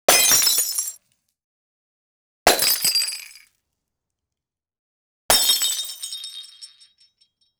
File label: GLASS BREAKING 3X